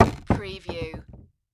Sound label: Wood Object Drop Set; Sound Effects Bible